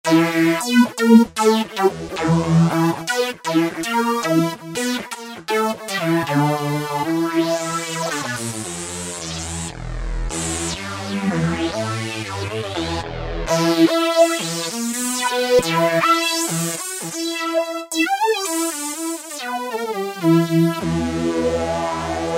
Legato Synth, Roland SR-JV80-06 Dance

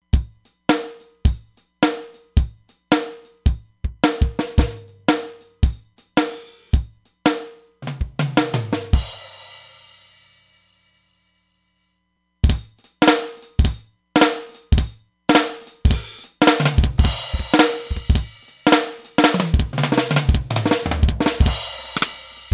EQ'd Slap, Roland DEP-5; Factory Patches

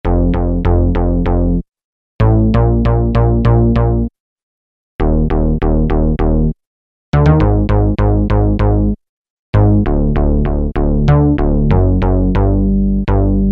Jupiter Bass, Peavey DPM Spectrum Bass

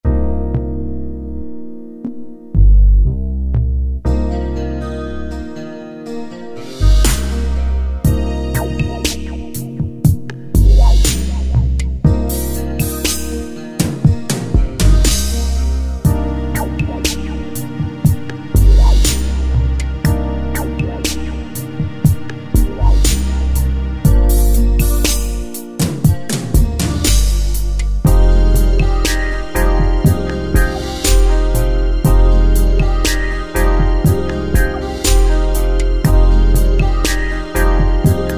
Pop Ballad, Yamaha QY70; Preset Styles

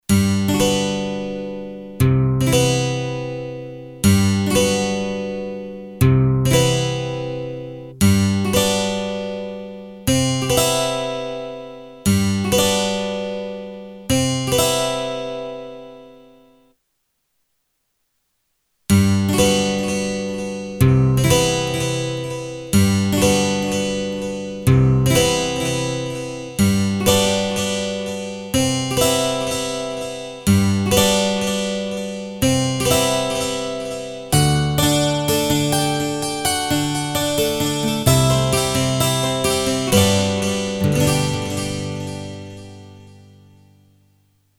Dynamic Delay; t.c. electronics M300

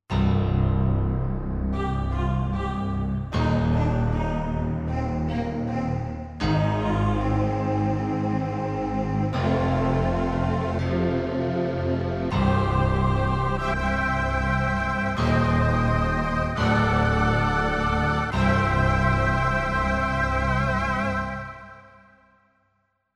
Film Score, Korg M1; Factory preset examples

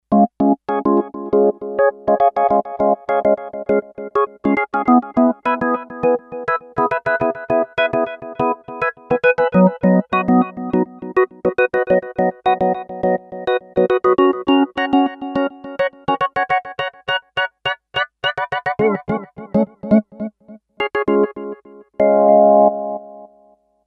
Kawai K5000S; PBminmaj

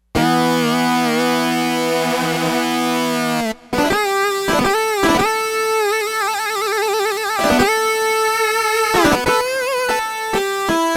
Metalized Dist, Roland D-10; Factory Patches

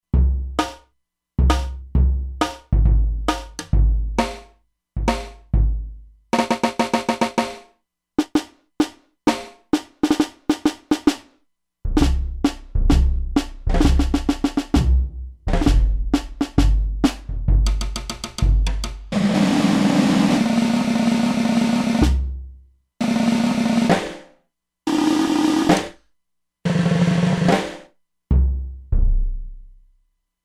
Bass Snare, E-mu Proteus-2; Factory Presets

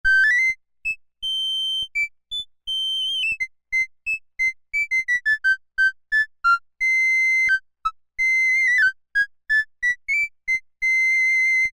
recorder, Korg M-500 micro-preset

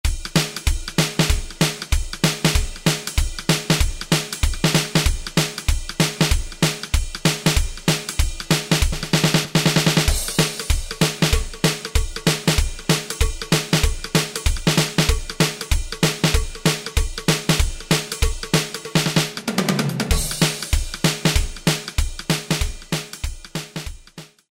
50s Rock & Roll, Alesis SR-16

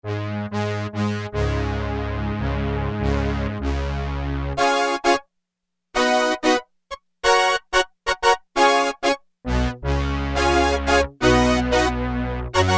Horn Section, Roland MKS-70

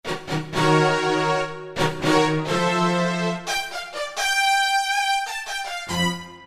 Jete Strings; Factory preset demos

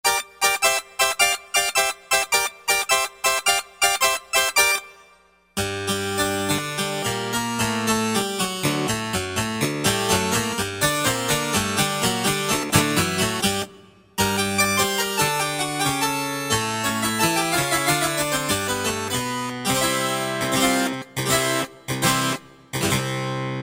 Double Stop, Korg N364; Factory Prog demos

